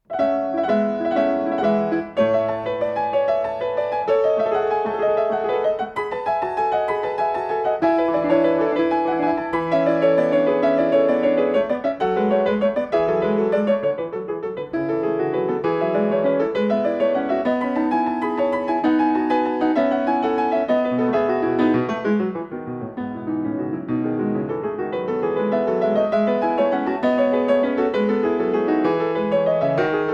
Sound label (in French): Suite En La Majeur Gigue